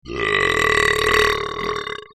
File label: brap